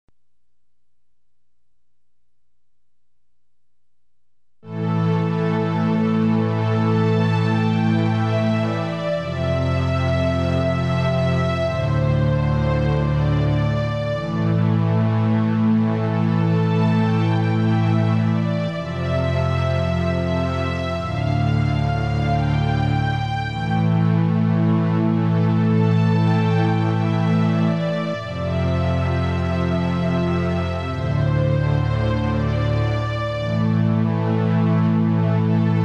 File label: Strings Alone